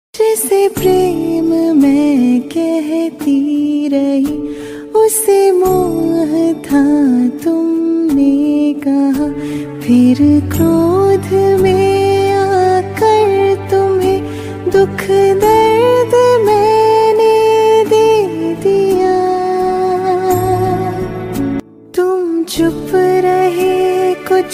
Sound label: New Bhajan Ringtone